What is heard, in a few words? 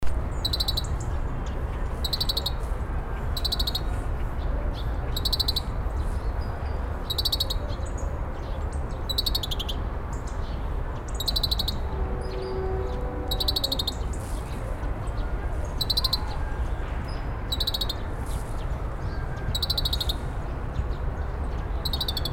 greenfinch grey capped Chloris sinica